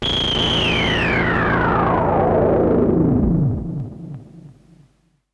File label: Classic FX; radiosonics; Classic Simple OSC FX